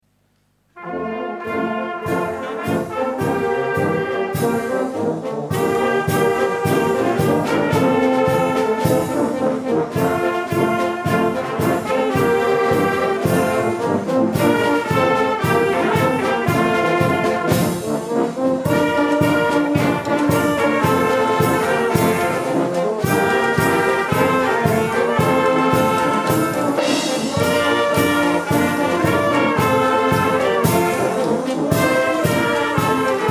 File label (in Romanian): Fanfara; Elim Viena; Duminica dimineata